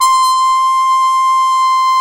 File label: BRS CPM TP, Cup Mute Dry; Roland L-CDX-03 Disk 2; Cup Mute Tpt